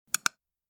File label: mouse klik